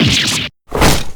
damage

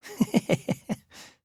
hehehe; leon

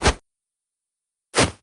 face hand finger open

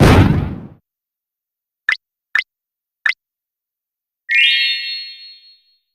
magic pantsu

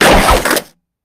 show off

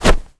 tug; decargo

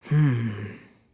hmmm; nagito

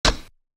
blip

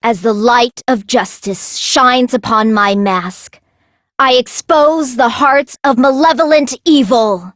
malevolent evil; sparkling justice

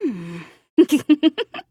giggle; toko

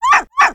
dog bark

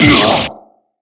damage

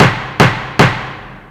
triple gavel

